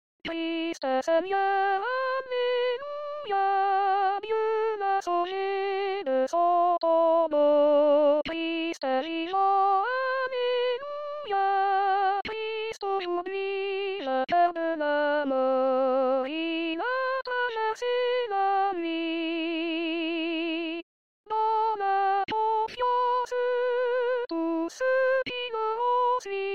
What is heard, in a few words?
soprano